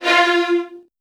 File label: VN 16TH, 2F1; ILIO; Kirk Hunter